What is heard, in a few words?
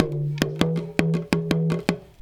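small tama groove; Best Service; World Colours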